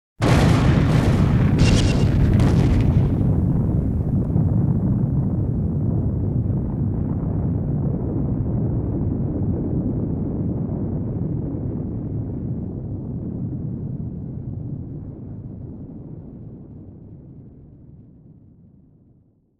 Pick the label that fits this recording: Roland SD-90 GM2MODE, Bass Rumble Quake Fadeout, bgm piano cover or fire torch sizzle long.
Bass Rumble Quake Fadeout